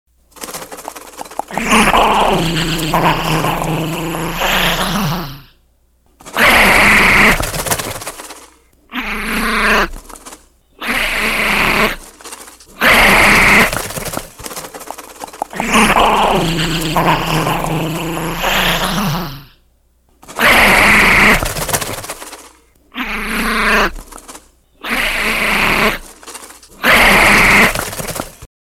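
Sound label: very scary sounds and screams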